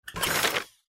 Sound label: picks depart; Hot Off The Press